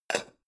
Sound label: pan close chink soft